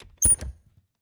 Refridgerator, old fashioned, wooden, steel, brass clasps, hinges, close, squeek, piercing; Eiravaein Works; Vaeyan IV